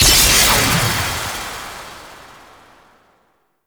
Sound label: Doom Sword Activate; Excalibur